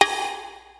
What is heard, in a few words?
perc, Percussion; Trance Explosion Vol1